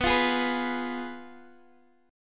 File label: F Diminished